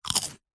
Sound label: human eat crunch potato chip mouth open